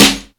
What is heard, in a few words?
Snare